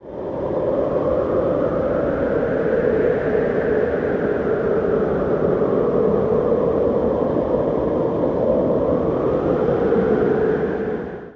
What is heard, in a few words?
Monte Gust